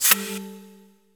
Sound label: mouse; Bombardier